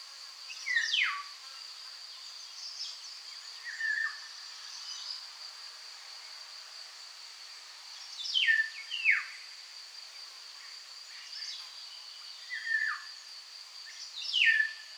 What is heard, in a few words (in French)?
Loriot d’Europe Oriolus oriolus